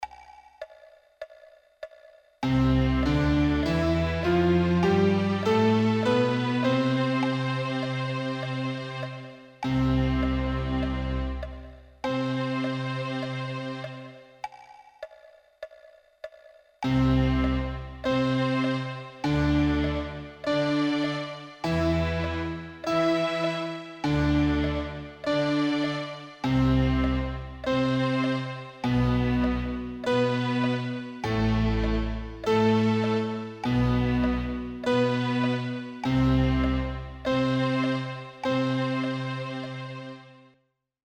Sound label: 8th Intervals